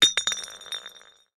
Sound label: alley bottle; DeadFright